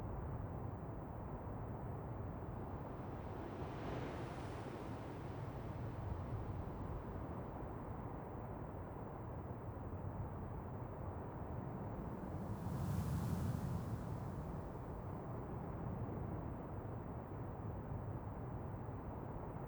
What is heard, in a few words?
winter loop